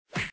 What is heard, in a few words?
hit